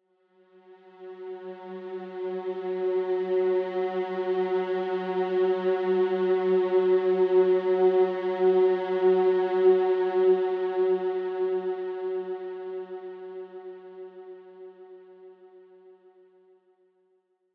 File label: ambient, vulcanus; Factorio - Space Age